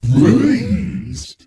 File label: ICE CREATURE